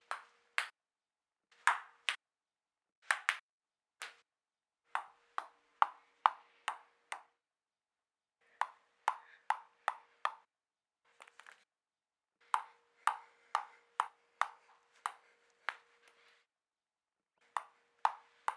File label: Table Tennis Sounds